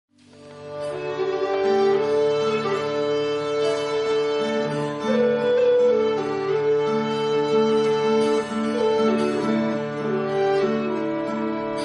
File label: kyrie de angelis